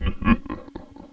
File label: laf